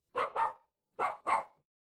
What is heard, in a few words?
animal dog bark